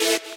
saw

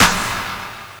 snare wave

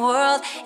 vocal

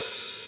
Open Hat; osu

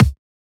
soft hit whistle; osu

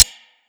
ticks

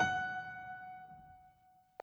Piano Chord

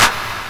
clap long

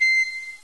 pipe

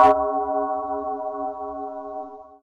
Bell; osu

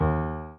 soft hitwhistle

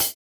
normal hit whistle; osu

